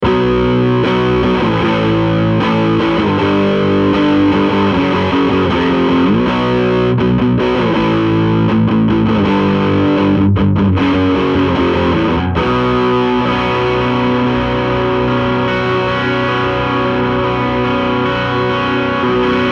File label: max gain tele